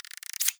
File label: characters cards